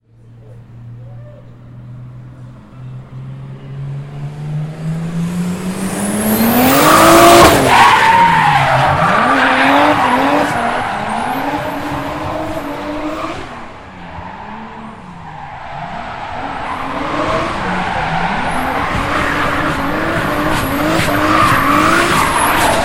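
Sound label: sounds of a car drift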